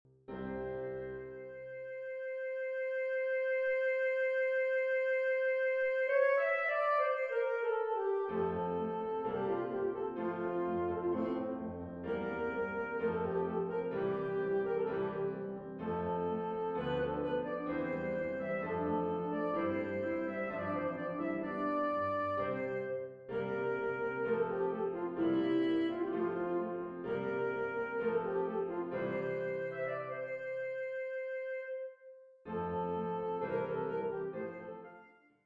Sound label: Concertino w PIANO